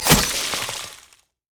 ice shard; LoL; lissandra

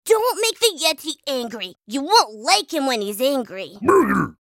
taunt

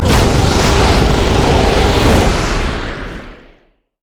flamethrower; rumble skin03

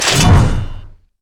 martial cadence attack; LoL; jarvaniv

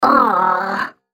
attack; BadRobotAmumu

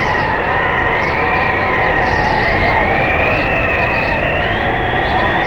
move quick loop; LoL; super teemo

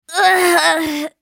Sad Mummy dying; Character Voices; English

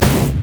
dragon basic attack; LoL; monsters

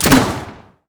attack; jinx base

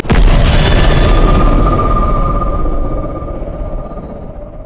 yasuo base quickdraw wind; LoL

